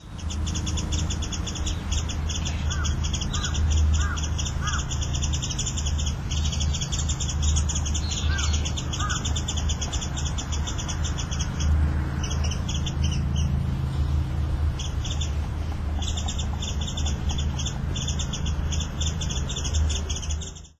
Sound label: white eye